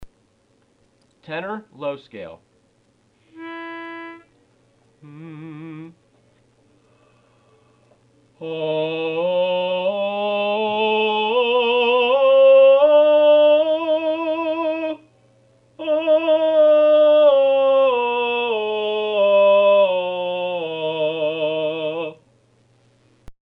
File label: tenor low scale